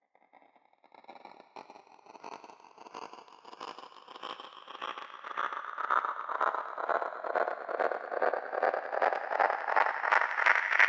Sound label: Whoosh